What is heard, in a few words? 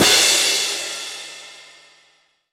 Crash Symbol; PokePark 2 Wonders Beyond; Wii